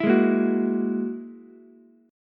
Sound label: Ab 7th Suspended 2nd Sharp 5th, Ukulele; Ab7sus2#5 strummed; 9,8,6,9